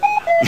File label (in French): boite a coucou